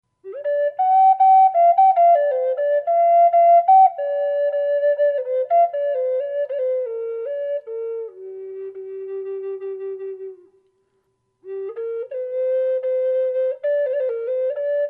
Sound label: reverb, flute; bison; collector flutes